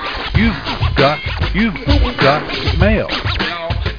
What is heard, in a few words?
mix rap mail; email